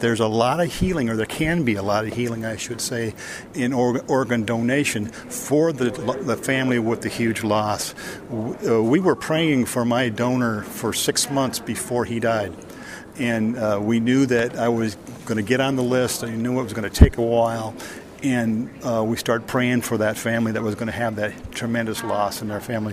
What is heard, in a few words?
ORGAN